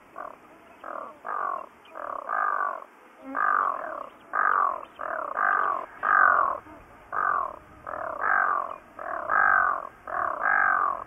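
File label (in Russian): gagara, loon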